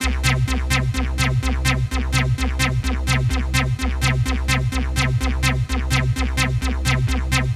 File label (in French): synthe acid